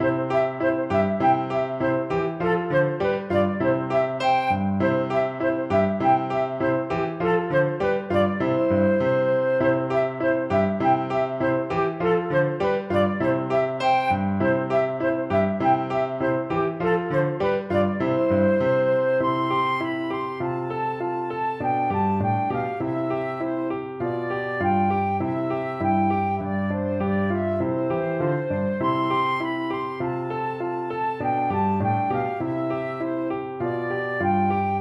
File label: ecossaise, flute